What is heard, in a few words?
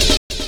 amen